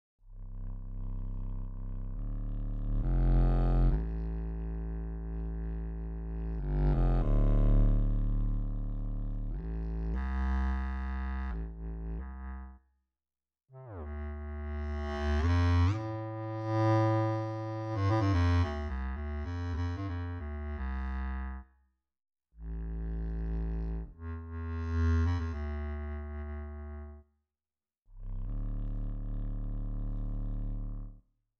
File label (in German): EWI Solo Bassklarinette